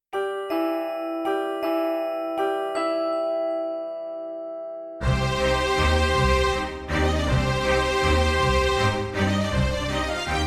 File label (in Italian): Valzer del campanello